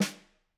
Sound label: Snr, drum